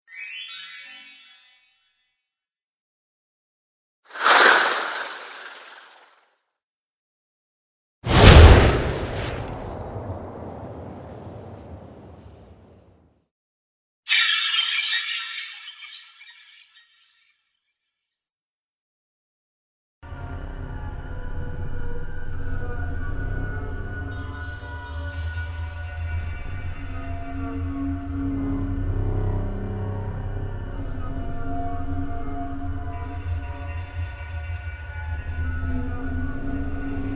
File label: magical horror